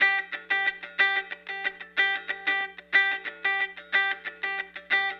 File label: Call And Response Guitar, 70s Analog Funk